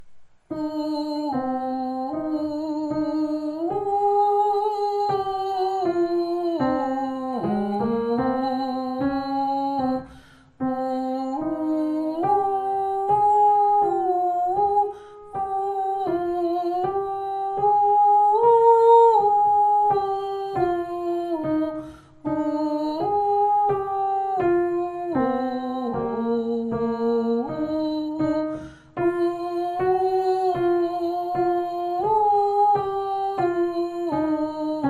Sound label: amazing grace voix 2